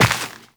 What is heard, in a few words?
Step Dirt, Footsteps, Walk; Warframe; Tenno